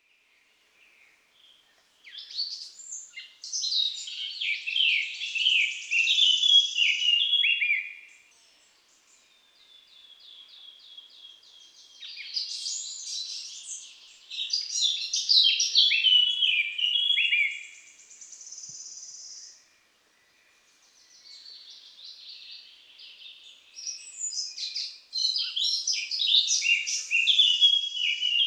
Fauvette à tête noire; sonotheque ornithologie

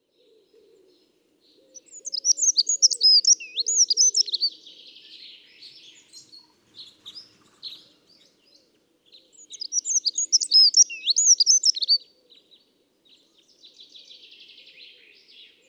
Accenteur mouchet; sonotheque ornithologie

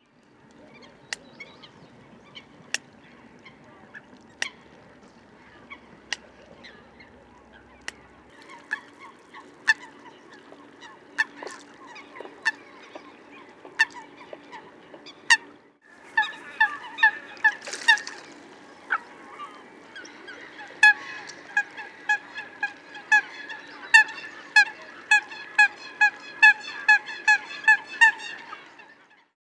Foulque macroule

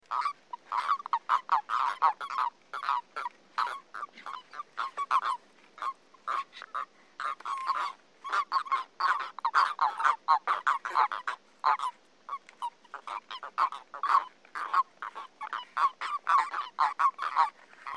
Crapaud commun

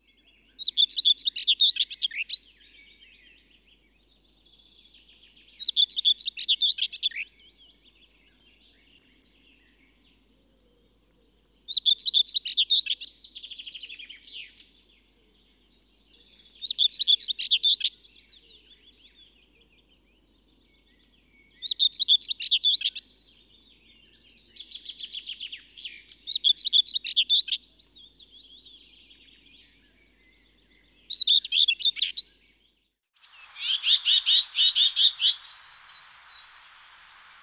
Fauvette grisette

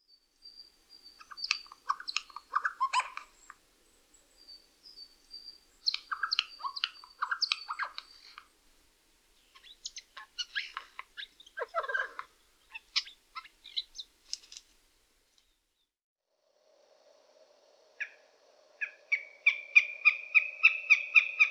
Geai des chênes; sonotheque ornithologie

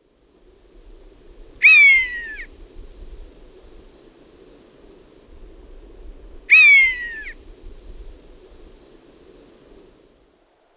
Buse variable; sonotheque ornithologie

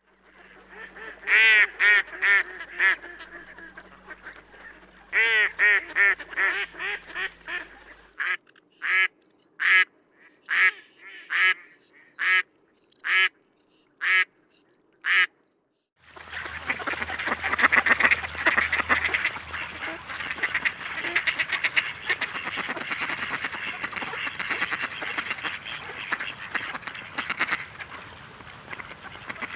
Canard colvert; sonotheque ornithologie

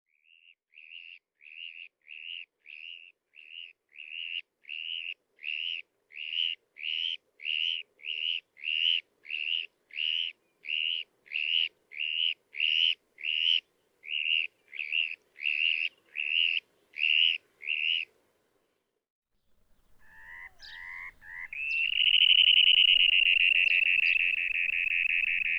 Bécasseau variable; sonotheque ornithologie

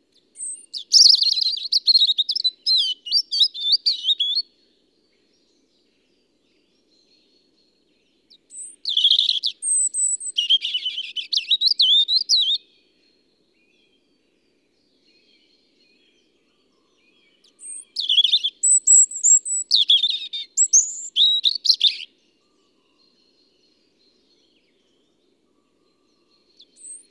Rouge gorge familier; sonotheque ornithologie

